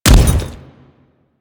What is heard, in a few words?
Step Default; Warframe; Grineer Ghouls; Ghoul Devourer; Footsteps